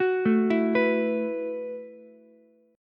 Gb Minor 11th Gb down Ukulele C6; Gbm11; arpeggiated; JGuitar

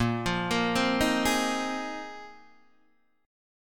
A sharp 9th Suspended 4th A sharp, Guitar, Open D

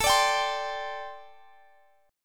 C Suspended 2nd, A, guitar chord; strummed

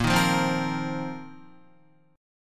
Bb Suspended 2nd Suspended 4th, Guitar; strummed

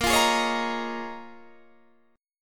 Bb Minor 11th Bb down Guitar DADGAD

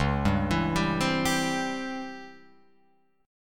Db Minor Major 7th Flat 5th Db down Guitar Open F; arpeggiated

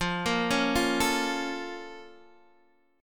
A sharp Minor Major 7th

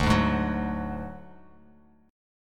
D Major Flat 5th D Bass Standard, chord; strummed; JGuitar

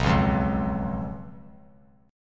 B Suspended 2nd Flat 5th B; strummed; JGuitar

